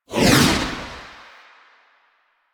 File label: Motorcycle Passby Whoosh Medium